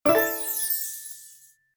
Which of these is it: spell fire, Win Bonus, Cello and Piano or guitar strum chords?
Win Bonus